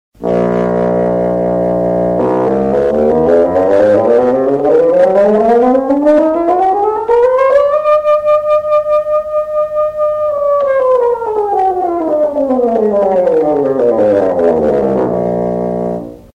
bassoon

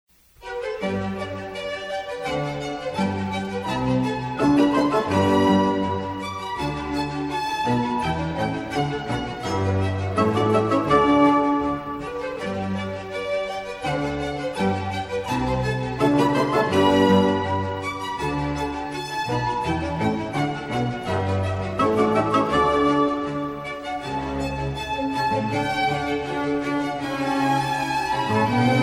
piano con

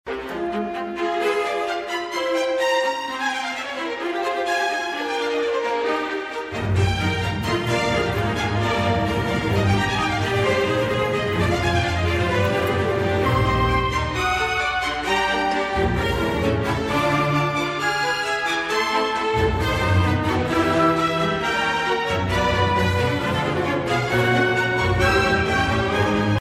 Polyphony; Mozart Sym40 4th Mov Dev

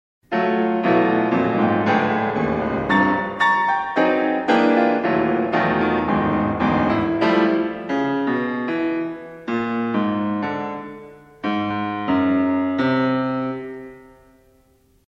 Expressionism Pierrot; Schoenberg

